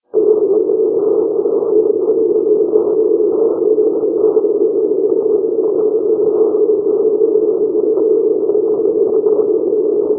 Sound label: wideband